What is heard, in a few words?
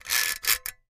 Caulking gun being loaded and unloaded. Tools, Hand Clicking; Woodworking Carpentry, Pumping